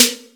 snare

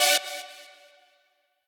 saw

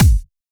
normal hit whistle

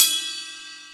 Ride

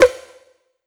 Trap Snare C; osu

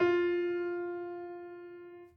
piano sus

